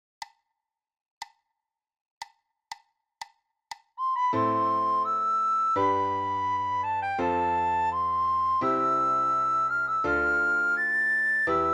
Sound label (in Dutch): sopraan; Blokfluitles